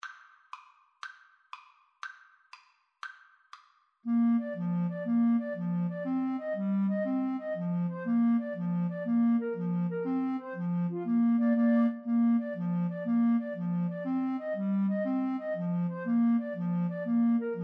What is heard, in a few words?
irish jig medley, 2 Flutes Clarinet